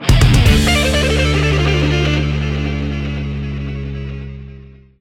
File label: Jingle Fail Game Over; Space Punks